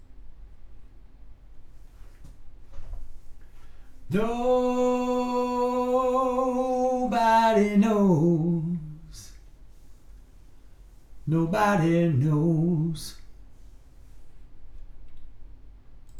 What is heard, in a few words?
vocals